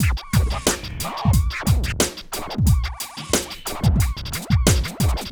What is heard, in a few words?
Beat